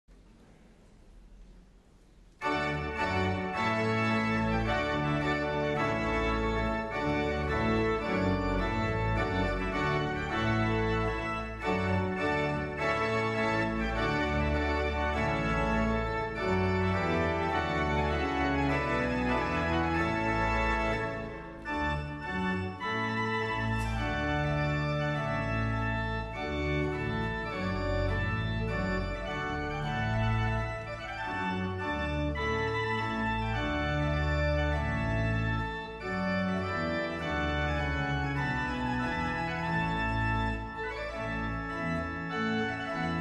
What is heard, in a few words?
Trumpet Tune; H. Purcell